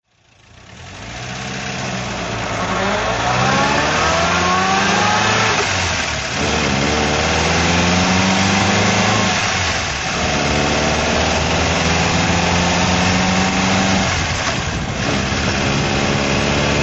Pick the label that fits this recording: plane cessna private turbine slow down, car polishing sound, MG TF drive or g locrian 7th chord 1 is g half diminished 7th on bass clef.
MG TF drive